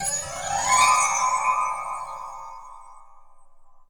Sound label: Mysterious Short; rise of nightmares xbox 360 gamerip 2011